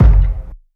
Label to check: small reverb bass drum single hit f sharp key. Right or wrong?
Right